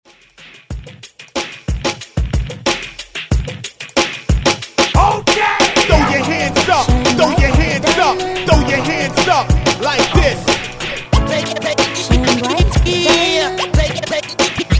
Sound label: Hype Redrum 92 Bpm; DJDOLLS